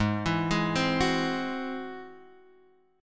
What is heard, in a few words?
G sharp Augmented 7th G sharp, down, Guitar, Half Step Down